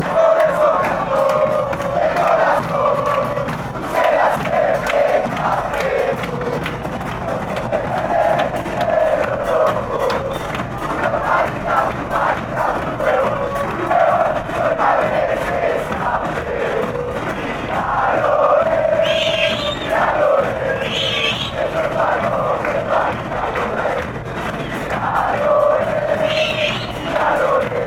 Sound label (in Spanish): millonarios chant; mfc